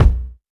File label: bass drum one shot B key, Royality free kick drum sample tuned to the B note; kick drum samples